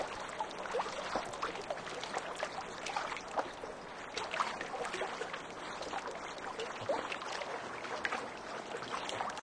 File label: lake waves calm; ambience